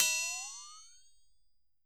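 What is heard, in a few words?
flextone, Roland MV8800 percussion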